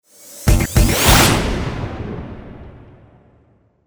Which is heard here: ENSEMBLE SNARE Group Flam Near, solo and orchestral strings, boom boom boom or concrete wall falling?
boom boom boom